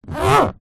flight case hand finger rub scrape along top metal; foley